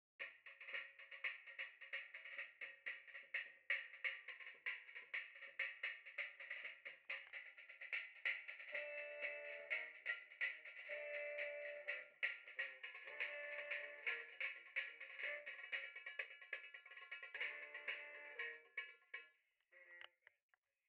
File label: clarinet DI